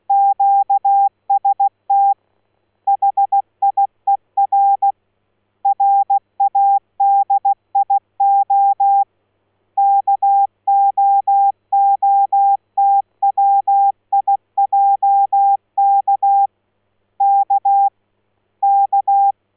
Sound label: Morse, Radio Kootwijk; Hier Radio Kootwijk